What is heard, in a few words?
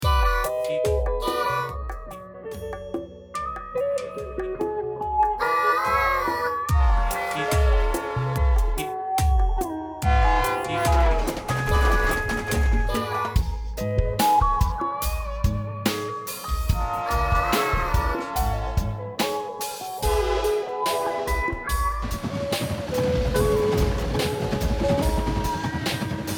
slo funk train